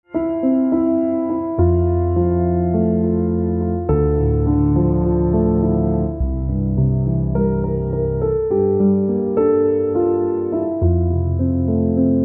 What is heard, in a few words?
Piano Silent